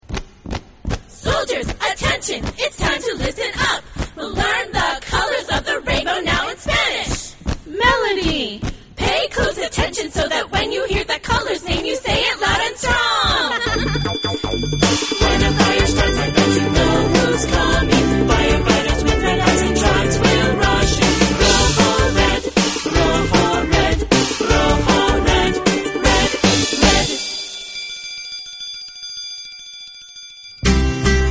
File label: melody